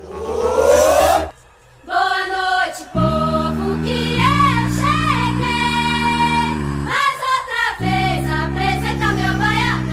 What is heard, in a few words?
world cup song